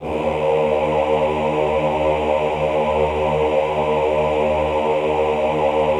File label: AHH D#1, Classical Choir; Best Service; Extended Classical Choir; AHH LO+HI LL